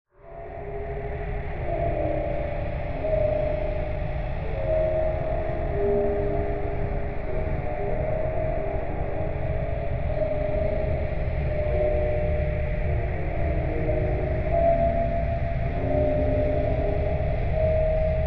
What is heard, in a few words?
Drone Dark Musical Warble; Musical Sound Design; Blastwave